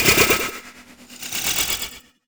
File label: weird; Best Service - XXL Production Effects